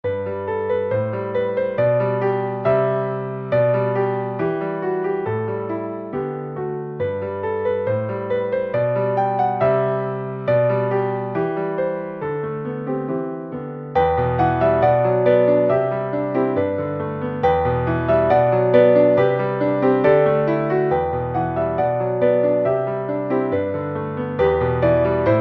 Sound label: Cheerful Piano; Besetzung: Klavier; Tonart: G-Dur